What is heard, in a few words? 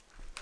crossbow admire, weapons